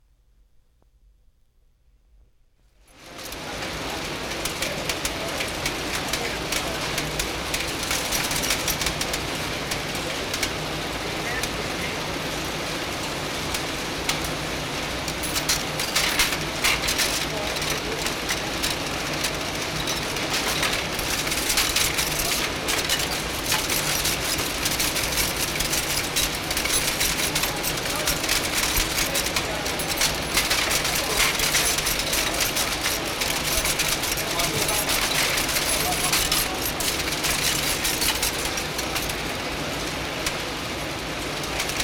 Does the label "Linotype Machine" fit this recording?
Yes